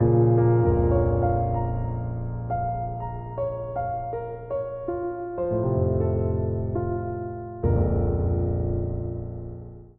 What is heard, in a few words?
smooth piano